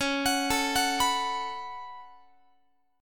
C sharp 7th Suspended 4th Sharp 5th C sharp, Guitar; C#7sus4#5; arpeggiated; tuning=4A,4E,5A,5D,5G#,6C#; guitar chord